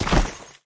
step with armor; smite; chronos

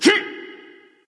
grunt jump; bellona

